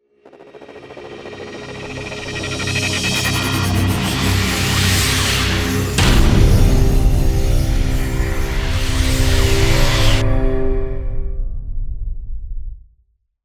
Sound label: Hybrid Transformation Logo